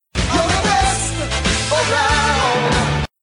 tone

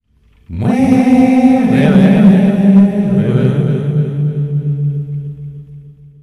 weehhh

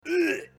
gagging

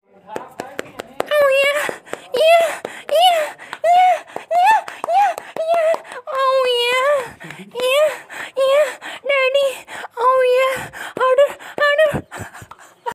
girl moaning by a guy

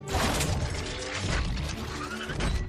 transform sound; transformers

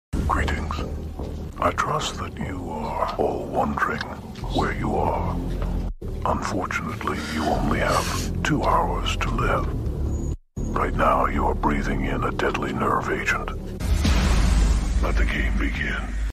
saw

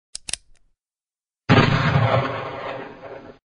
44 magnum gun shot

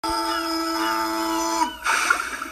Uhhh

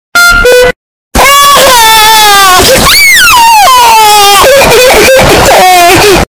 crying but earrape; george pig

